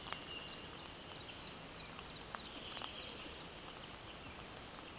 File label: Phylloscopus collybita